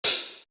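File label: Hi-hat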